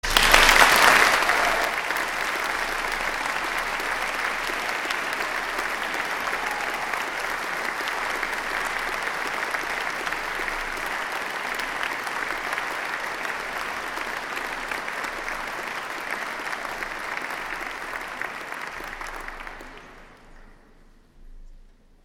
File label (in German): Folkensemble Auld Lang Syne Applaus